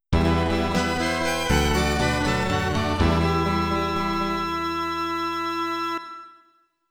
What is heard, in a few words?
Jingle, MT-32; Gulf War Soukouden; pc 9801